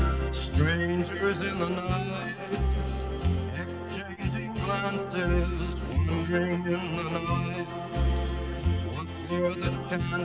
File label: music